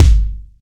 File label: small reverb kick drum G key